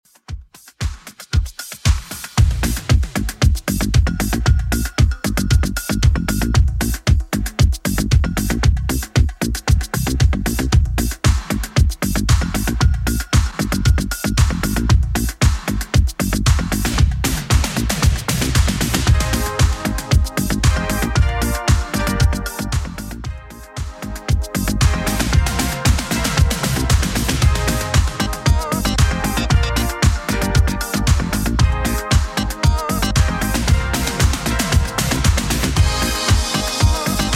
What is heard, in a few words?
80s Dancemix 115bpm